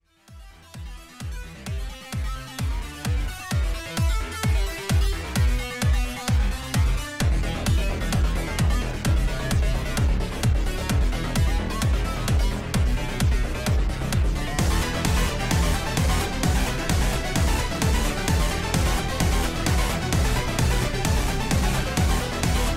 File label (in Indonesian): Nada Dering Anime; One Punch Man